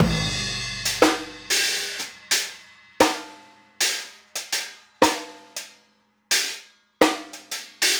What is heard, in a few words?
Trap Kit, Drums